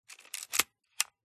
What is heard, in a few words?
Camera Film 35mm SLR CANON A1 Film Advance Wind lever; MKH8040; PMSFX